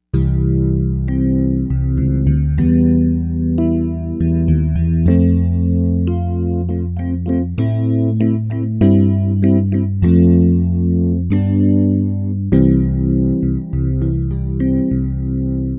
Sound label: Clear Tone Solo